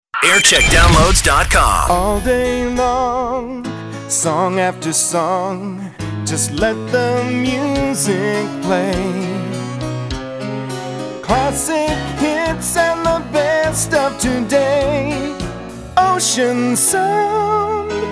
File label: jingles; ocean sound